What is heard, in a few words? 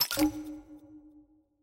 toggle off click; vc team